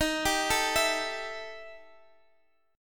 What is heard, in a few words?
E Minor Major 7th Double Flat 5th D sharp